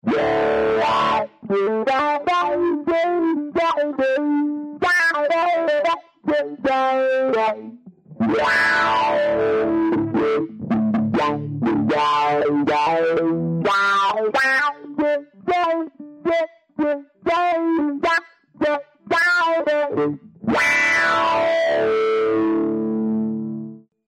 tube preamp + auto wah

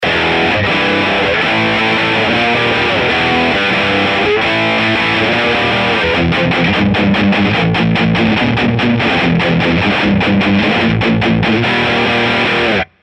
drive, mesa

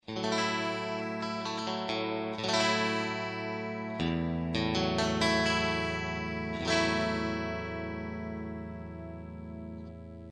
L6 piezoacoustic2

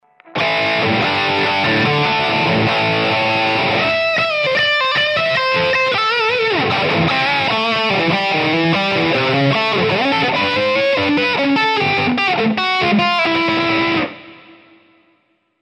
solo 100 HU drive, PODxt Live

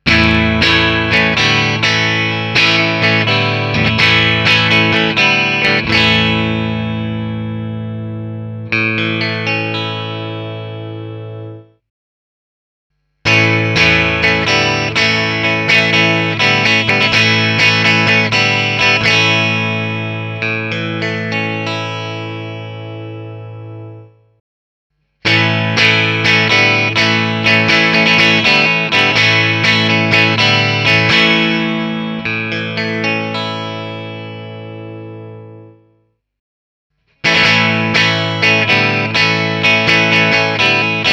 kable fender

